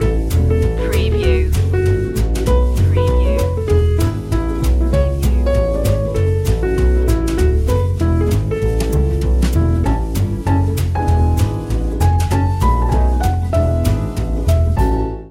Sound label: we wish you a merry christmas jazz